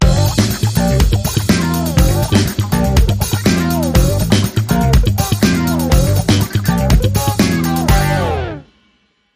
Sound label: Groovy Jam 25, Sting